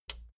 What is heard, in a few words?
plastic button small latch push